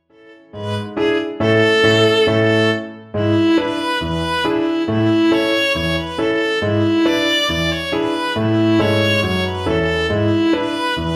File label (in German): schleifer polka